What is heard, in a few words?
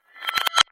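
reverse sound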